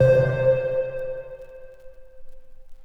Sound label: Prodigy Dark Pluck Verb Vinyl Synths C2; vinyl keys